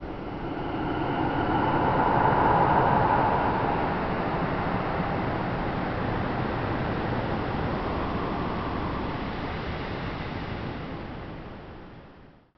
Horror Moment

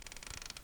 Wind Up

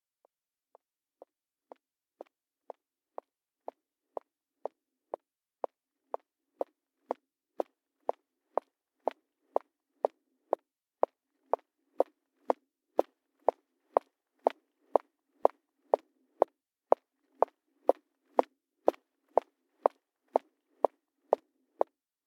High Heels

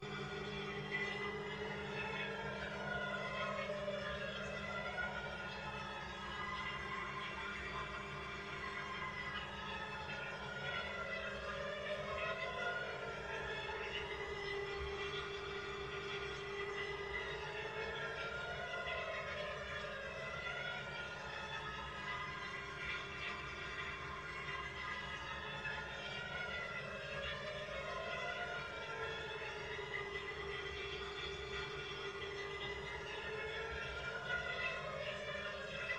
Sirens Apocalypse Multiple Loud Boomy Sirens

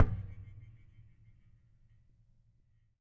Hit Knuckle

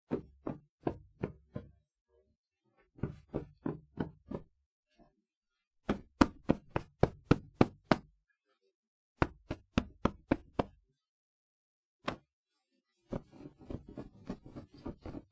Pillow Being Hit Puffed Out

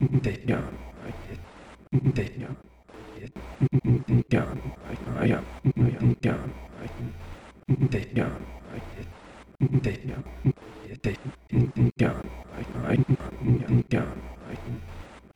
Vocal Rhythm Loop 125bpm

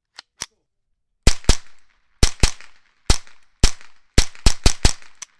22 Rifle Multiple Shots

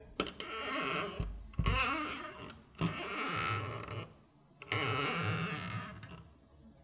Door Creak Multiple Creaks Wooden Door

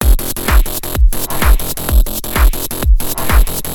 Drumbeats Melodic Tech House Loop

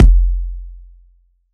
Kick Deep Kick

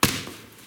Hit

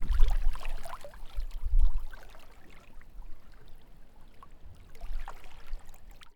Soft Mini Little Waves

